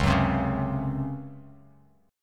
C sharp Major 7th Suspended 4th C sharp Bass Standard; C#M7sus4 strummed; JGuitar